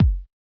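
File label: Bass Drum Analog; Yamaha DJX-2B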